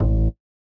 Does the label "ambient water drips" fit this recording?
No